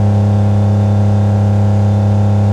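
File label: Truck Cherokee Loop; SFX Kit; Trucks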